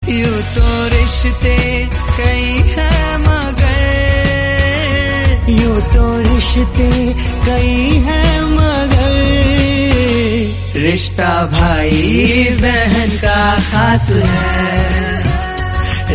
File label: song hindi